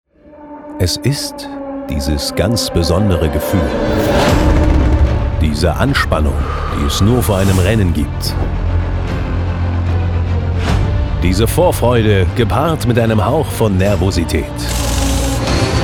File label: Porsche Carrera; LOFT VOICES